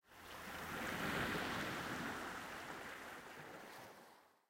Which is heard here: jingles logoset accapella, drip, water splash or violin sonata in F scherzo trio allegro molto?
water splash